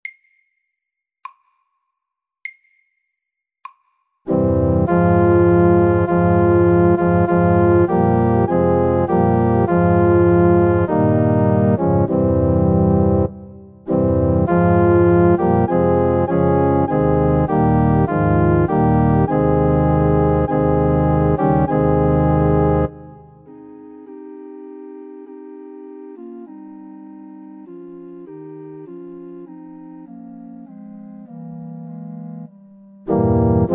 Marche funebre SAKTE

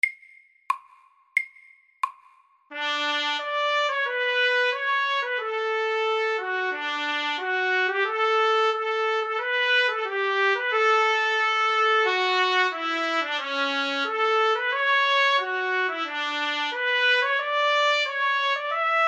Etyde i E dur MIDDELS

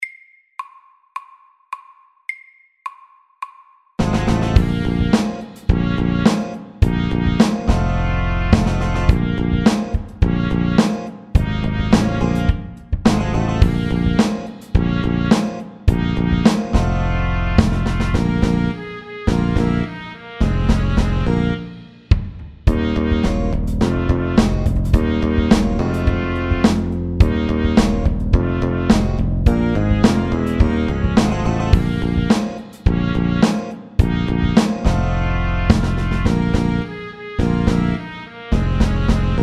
Funky MIDDELS; Funky monkey

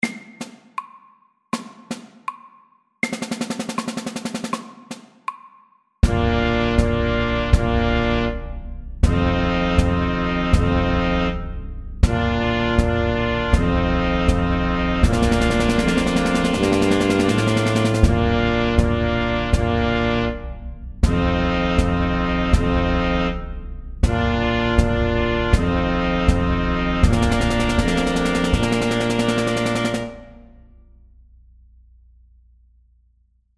Minimarsj SLOW 80

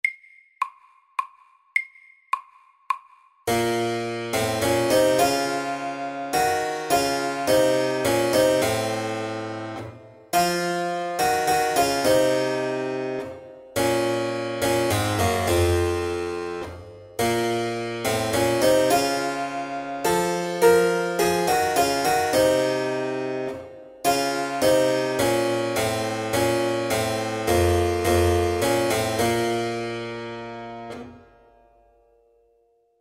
Menuett MIDDELS; Metall menuett

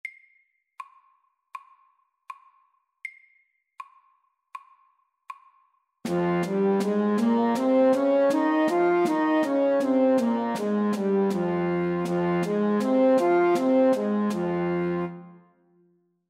F moll natura MIDDELS

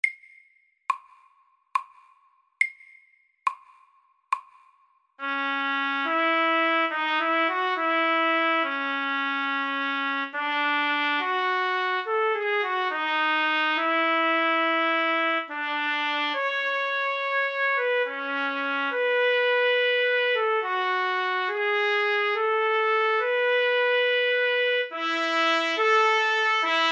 Etyde i H dur SAKTE

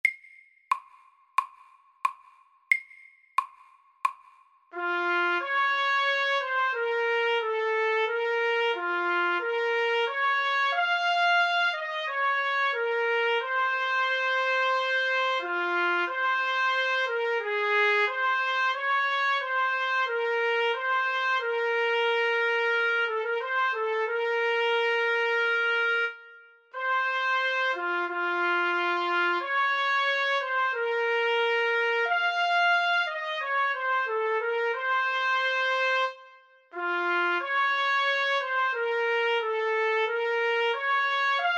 Etyde i C moll SAKTE

